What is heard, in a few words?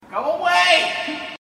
Is Calling Pop